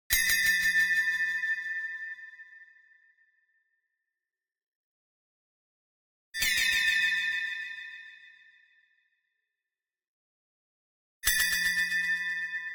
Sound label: BLING Bell Delay; boom library; Anime Essentials